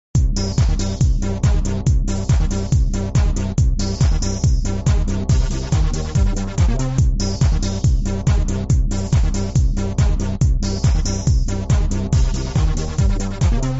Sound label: music; m1911; item asylum